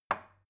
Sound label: glass put down cupboard soft